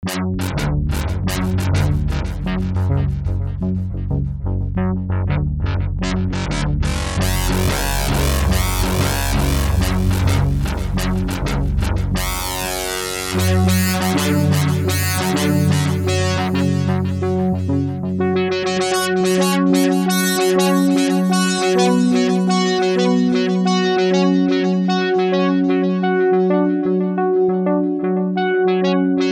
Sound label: filter test